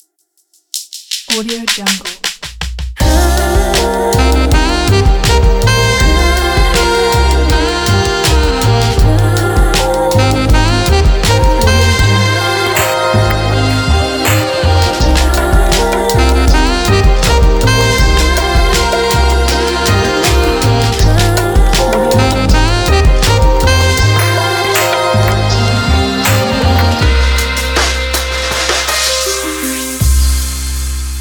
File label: sexy soul intro